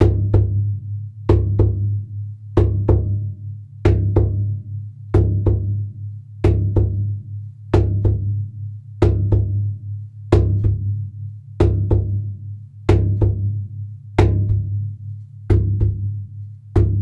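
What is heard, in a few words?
Big Drum sheepskin mallet; Stars (Tachenump)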